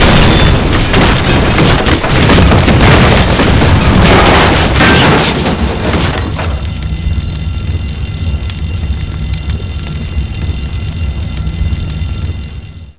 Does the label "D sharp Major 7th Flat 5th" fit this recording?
No